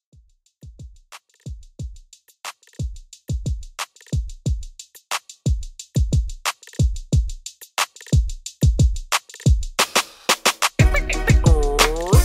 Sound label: Old HipHop Redrum 90 Bpm